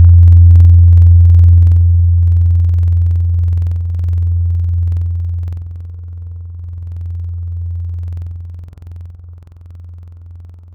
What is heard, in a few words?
sine pluck; Synthetic's Serum Collection; Chill Trap 2 Tables